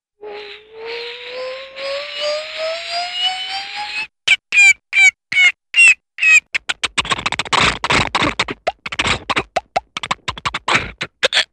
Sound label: Funny Vocal Airplane & Helicopter, Cartoon; Hollywood Edge; Cartoon Trax